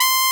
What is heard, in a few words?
C4, BRASS